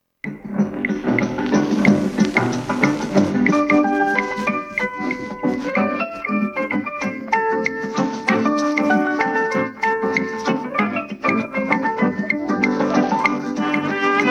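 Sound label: backing track; kukaracha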